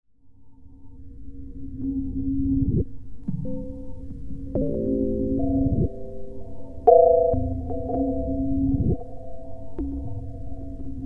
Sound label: spaceship